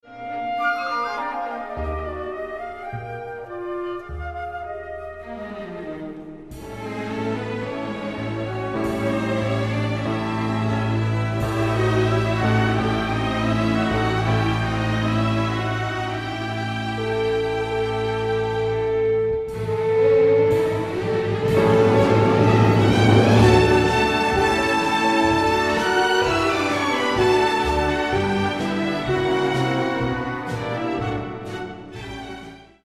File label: Gavotte